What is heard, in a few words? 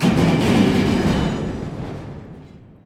shunting track, train; best service Blue Box